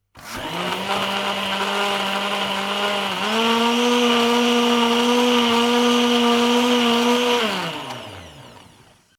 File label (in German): Mixer Stufe 1 dann 2